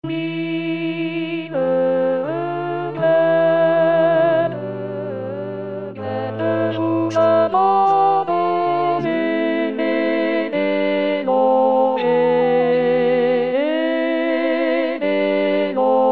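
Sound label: alto; Mille regretz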